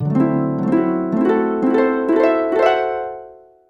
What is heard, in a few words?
fairy; legend of zelda the the wind waker hd 2013 wii u gamerip